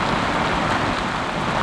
Car Gravel

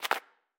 Move

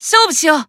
Warcry

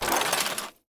Metal Block break; The Binding of Isaac

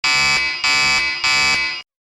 remote alarm; SFX Monsters boss2intro

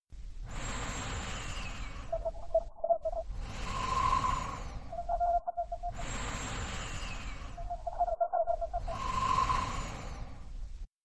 Giant Kiwi Sleep; Lethal Company

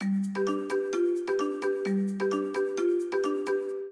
Diegetic Music Amusement Ferris Wheel Intro; lego horizon adventures

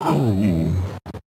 Death; Joblin Joblifter

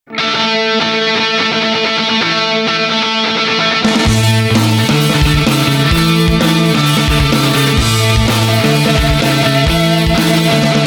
Coin Intro; XGames Snowboarder

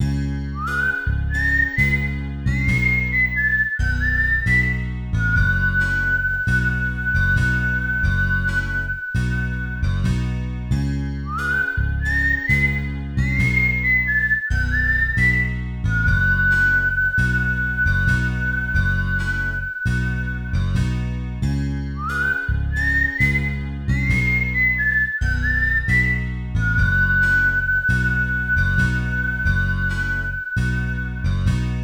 Defeat

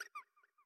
Bat Aggro

